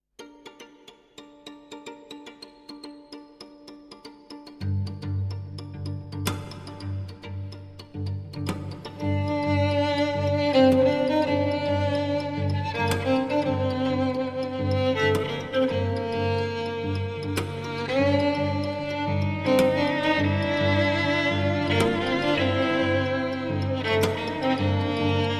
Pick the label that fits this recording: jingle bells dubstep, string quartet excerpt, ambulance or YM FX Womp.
string quartet excerpt